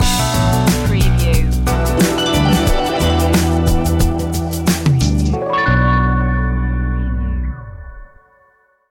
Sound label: slow funk reggae stinger